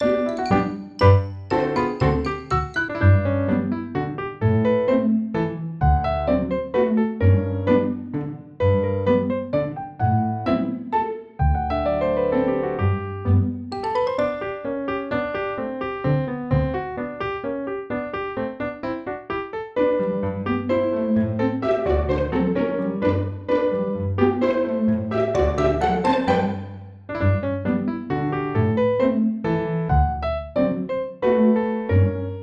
Gameplay

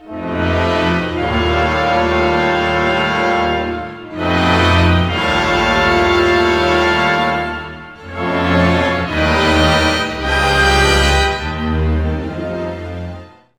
Test Music Intro; Street Cricket Champions; PSP

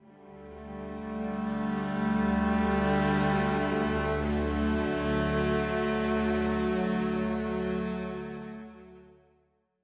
Loop String Swells Dsus4 Root To Dm Root

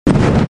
Sfx Fireblast; Sfx Baphomet Fireblast

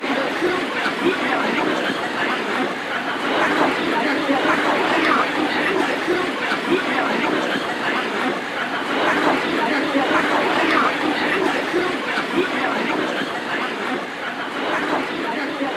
Ambience Crowd; Grand Fest

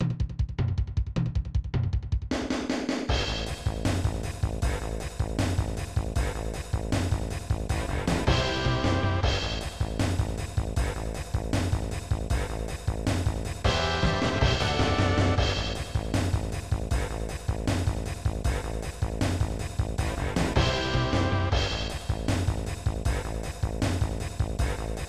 Penalty Kick; J.League 96 Dream Stadium; SNES

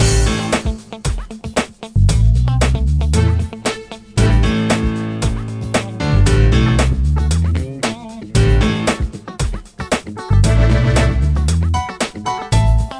Dance

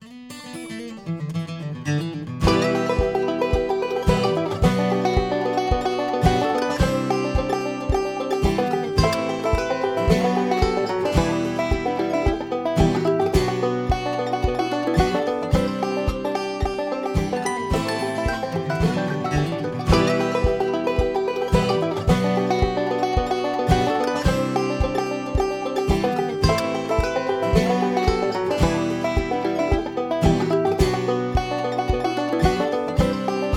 Radio Music; Jurassic World Evolution 2; PS4, PS5, Windows, Xbox One, Xbox Series X/S

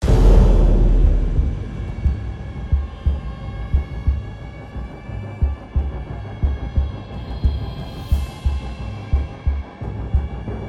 Whisk Loop Intro; batman arkham asylum

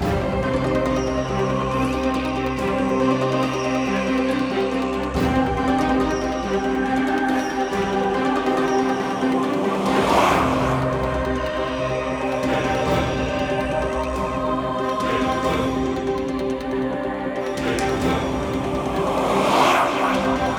Enemy Turn

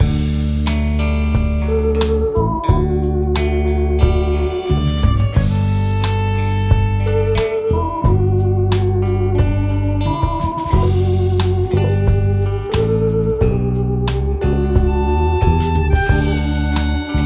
Hip Stir Café (Lose); Cooking Dash

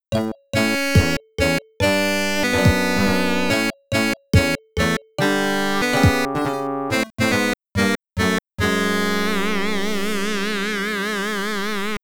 Game Over - Time Over, genesis

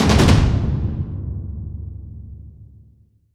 Stingers; Wrath Speaks

